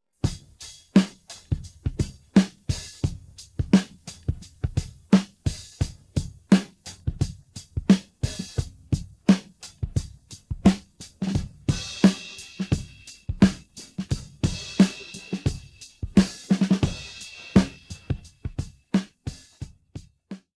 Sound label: Drums